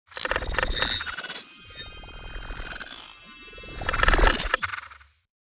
promo pad

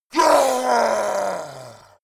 yeti attack shout aggressive; schreie

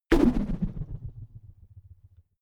whoosh rubber band vibrate soft; swooshes-swishes

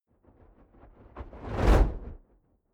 fire whoosh fade in; swooshes-swishes

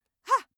female attack hah; schreie; menschen

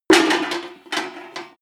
impact metal bucket; maschinen; soundpool

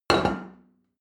plate place table hard

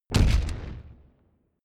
wood stack bass; whooshes rises; hits impacts

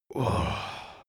male relief sigh; soundpool

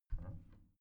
bathroom cabinet creak open soft; objekte; tueren

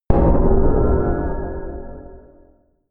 sub wobble distort; hits impacts

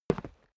step boots single concrete single stair down; Footsteps; schritte

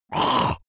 monster choking zombie short

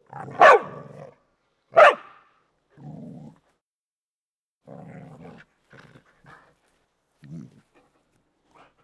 bardino mix bark growl, dogs; natur-tiere; hunde

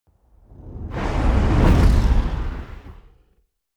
sci-fi vehicle take off growl; raumschiff

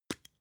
spice jar smooth open; industrie wirtschaft; gastronomie

